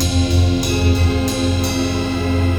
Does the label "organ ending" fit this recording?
Yes